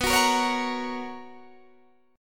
B Major 7th Suspended 2nd Guitar Standard, chord; BM7sus2; strummed; JGuitar

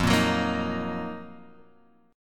Eb Minor F; Ebm/F strummed; JGuitar

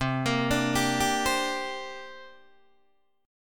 C 7th Suspended 2nd C down Guitar Open D

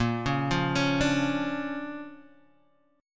D Minor Major 7th Sharp 5th Bb, guitar

